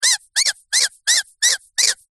leisure toy squeaky squeak hard broken

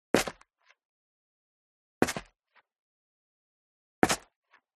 STEP Dress Boots Concrete Grit Single Left; FOLEY; smartsound

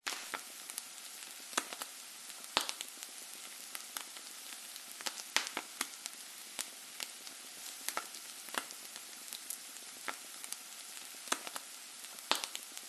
food egg fry in pan

